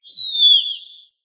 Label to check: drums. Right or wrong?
Wrong